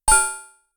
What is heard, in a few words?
coin; 落下音・はねる音